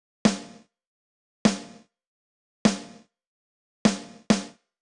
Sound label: normal Snare; Raddy